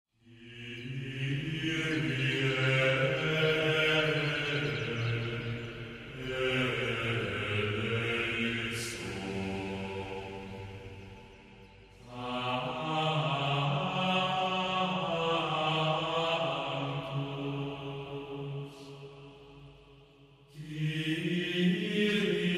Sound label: Gregorian Devotion